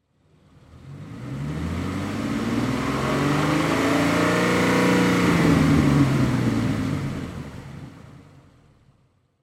Auto Ford Gt 500 Kr Mustang Engine Rev Automobile Car; Transportation; Cars